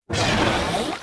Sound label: start, ships; Quantum Redshift; kukai